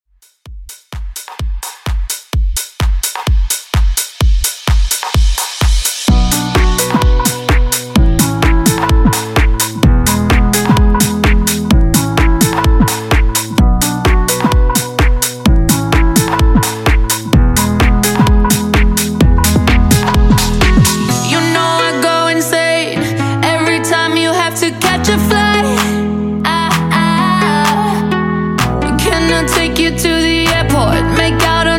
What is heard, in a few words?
Top40 128 Bpm; Redrum